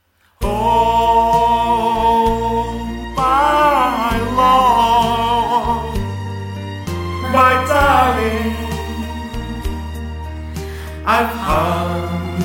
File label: Song